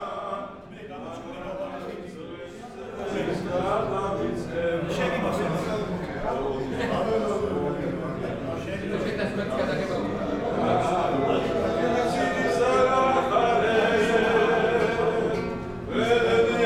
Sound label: tbilisi samgori restaurant sing; residency tbilisi